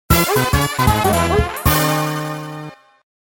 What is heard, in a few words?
draw music